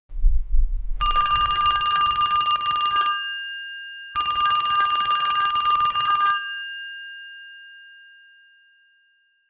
android phone ringing

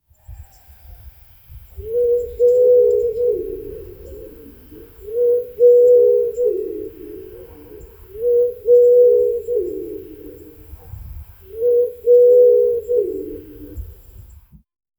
the call of a loon; birds

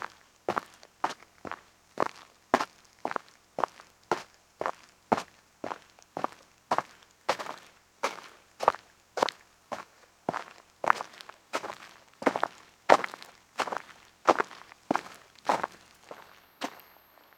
isolated footsteps, hurried

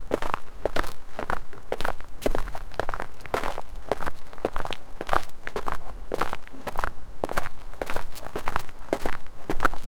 footsteps running on the, road

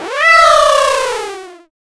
cat jumping sound effect

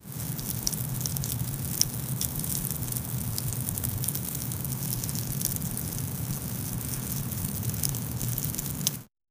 sparkle

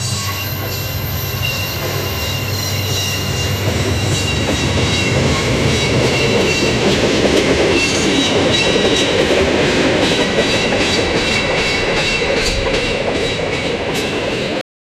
a sole train passing near, braking

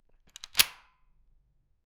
futuristic heavy lock

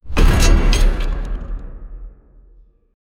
giant mech footsteps meta, robots

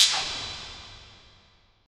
reverb tail

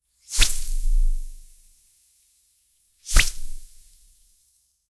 fluid flow swoosh

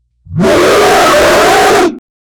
tyrannosaurus rex roar

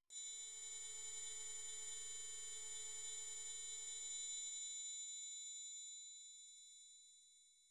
a transition stinger that, slot machine

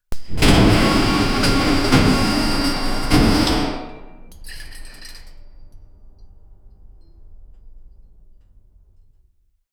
realistic industrial soun, factory

